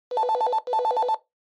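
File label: Handheld Transceiver Signal Transmit Call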